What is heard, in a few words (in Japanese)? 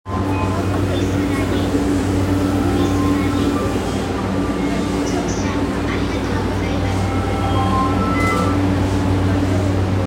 JR 山手線 鶯谷 CCW, train sounds